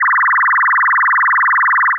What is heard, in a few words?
FM mod